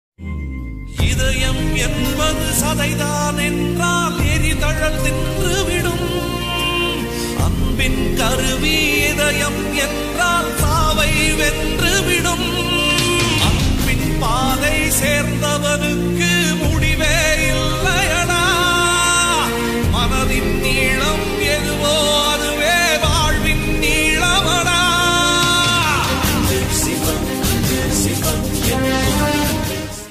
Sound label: movie bgm ringtone in tamil; anbe sivan